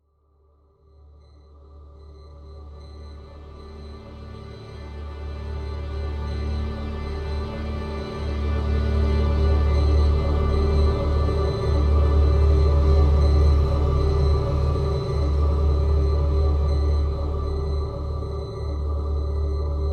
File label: Monitor Music Buildup; Stanley Parable